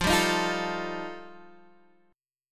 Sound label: G 7th Suspended 2nd Sharp 5th F chord